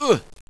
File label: player pain